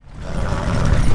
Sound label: horse